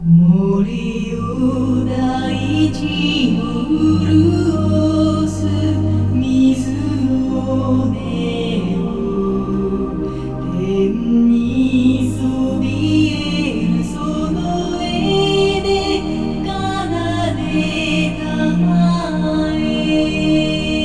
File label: music